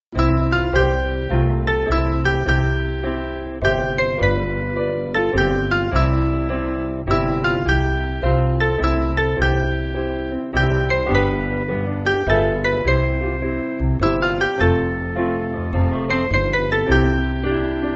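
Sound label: Piano, Music Backing Files for Churches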